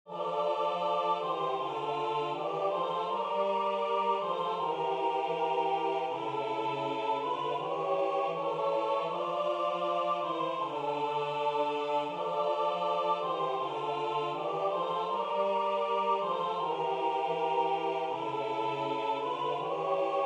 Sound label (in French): Tutti